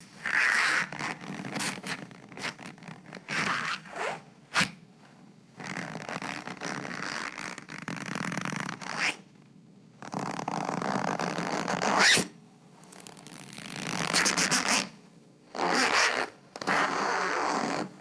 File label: thick rubber twisting and squeaking